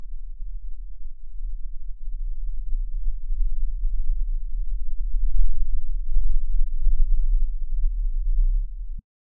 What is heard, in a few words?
bloop realtime